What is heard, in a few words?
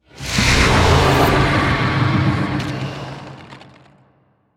sfx relic activation; prethoryn blood queen; Stellaris; ancient relics